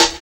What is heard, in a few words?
MANIA SNARE; BONUS SN2